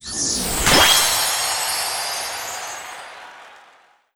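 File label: Stage Clear New Record; Se Stg Clear New Record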